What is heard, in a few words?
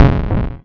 baaaam (land), atari